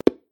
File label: Telephone Signal Tone Noise